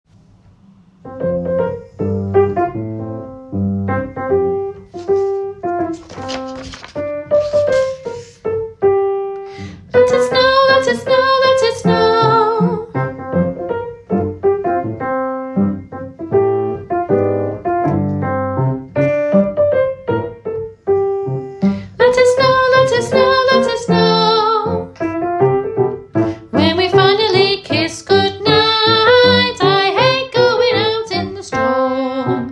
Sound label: bass